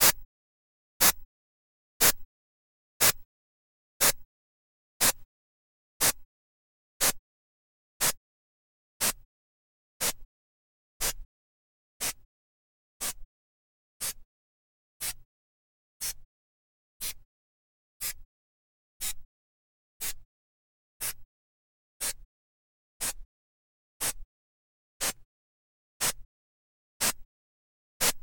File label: Mid, noiseburst